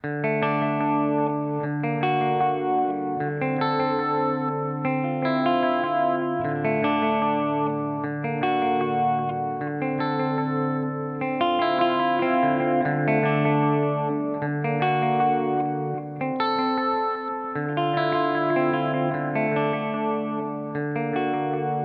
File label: HX Stomp Delay Adriatic; Vergleichstest